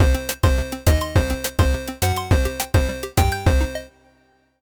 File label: fill; cube-tube; pyramids